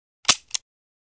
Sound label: gun